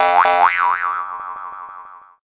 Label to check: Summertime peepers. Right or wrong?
Wrong